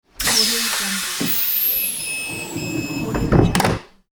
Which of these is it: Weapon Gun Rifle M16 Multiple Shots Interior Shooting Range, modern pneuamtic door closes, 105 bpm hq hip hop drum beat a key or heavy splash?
modern pneuamtic door closes